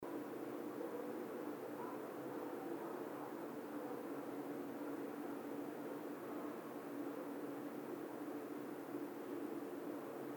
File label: howl, Coyote